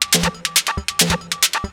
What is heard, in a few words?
drum 138, drum-loops, loop-grinder, Techno